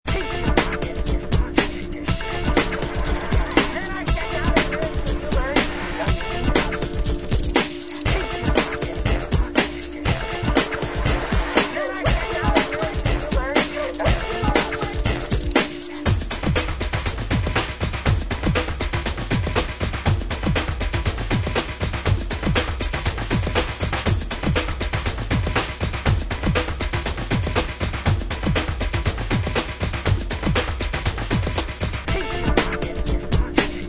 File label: Rythm March